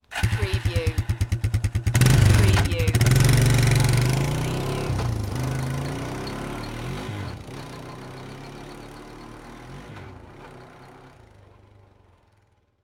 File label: Quad Bike Start Up and Drive Off